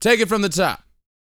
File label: VOX, R&B Dance, HIP-HOP